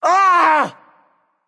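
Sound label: non verbal ouch, voice; cernunnos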